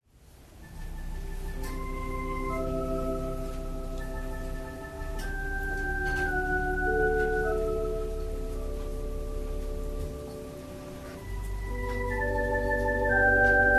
Organ prelude

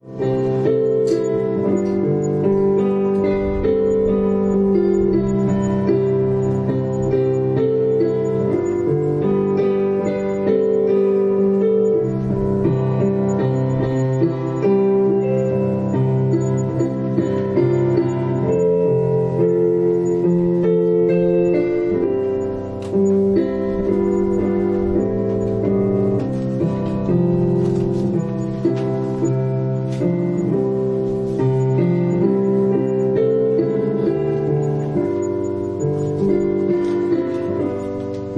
Piano prelude

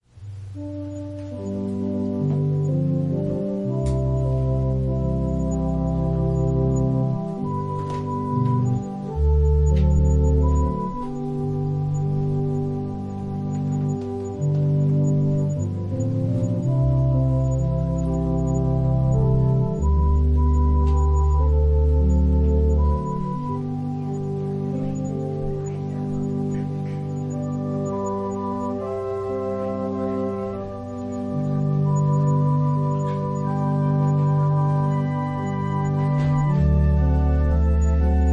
Organ prelude; The heart of the Gospel

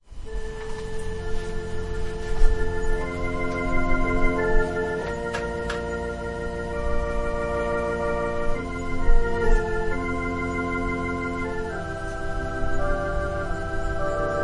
Organ prelude

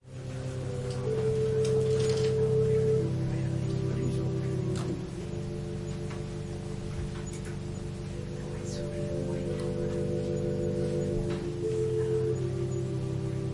Organ prelude

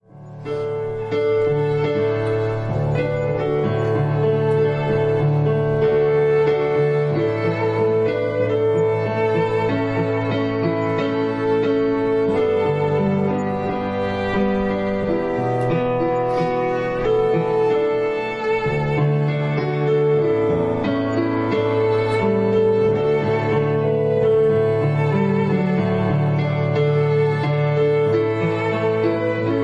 Piano and violin prelude

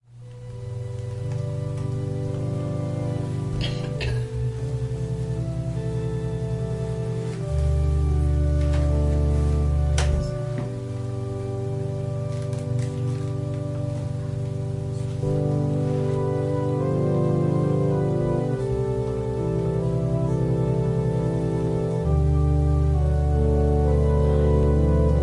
Organ prelude; Trials to triumph

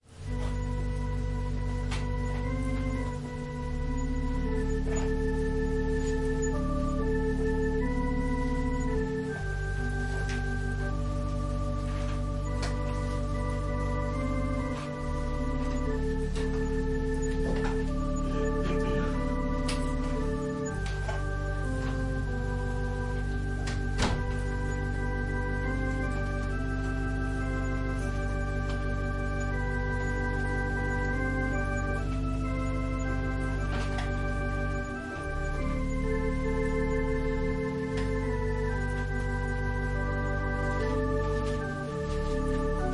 Organ prelude

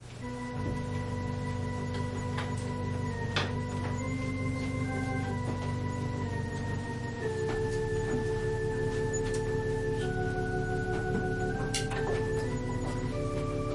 Organ prelude, Praise

